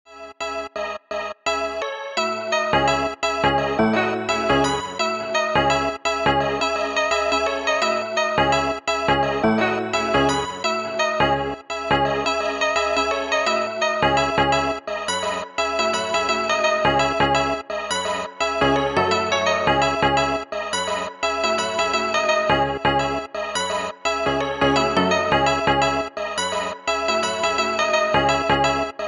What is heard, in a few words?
Electro loops